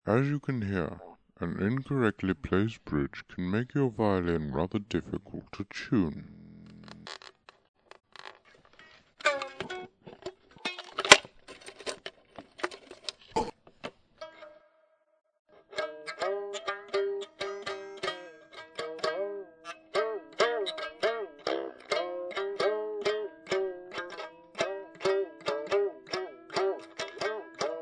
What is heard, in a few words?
violin bridge